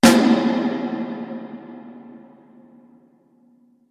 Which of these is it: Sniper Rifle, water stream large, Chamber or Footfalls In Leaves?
Chamber